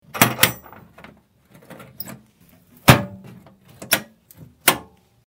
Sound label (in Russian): yashik s instrumentami